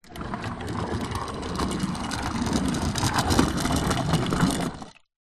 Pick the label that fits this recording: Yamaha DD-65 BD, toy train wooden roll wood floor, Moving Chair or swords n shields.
toy train wooden roll wood floor